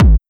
bumm

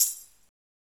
soft hit normal

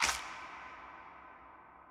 clap; osu

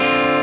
C#m7

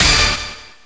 hit hit

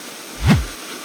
held beat loop

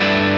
0 3 2 0 x 3 G6add9

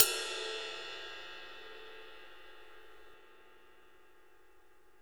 drum hit whistle

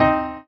Drop Lead; osu

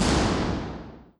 thunder

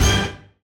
funny orchestra hit (g)

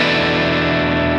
Em7(11)

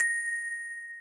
bell

